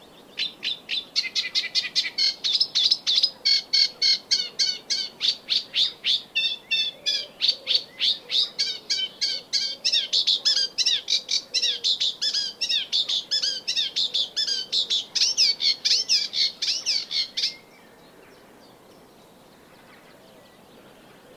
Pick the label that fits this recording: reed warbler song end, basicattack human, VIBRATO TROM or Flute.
reed warbler song end